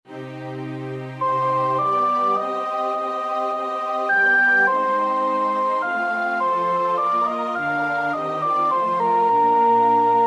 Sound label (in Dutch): sopraan; Purcell (Henry); Dido and Aeneas